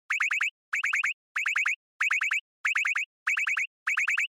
Panic Fast; Onoma

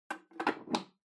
Pendulum Clock Hardware Key Insert

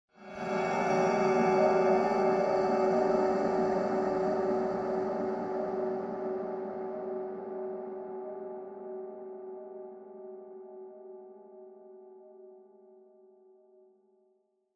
Horror Accent Low Long

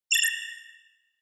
Cyber

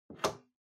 Pendulum Clock Hardware Key Remove

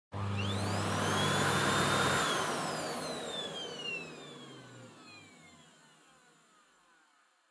Vacuum Cleaner Vacuum Floor Soft Mid Still

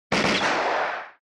Gunshot

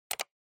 PC Mouse

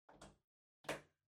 Pendant Light Far Ceiling Socket Plug In

